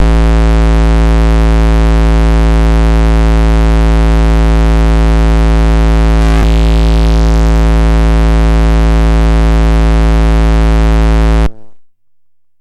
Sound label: erratic clutch deluxe subbass normal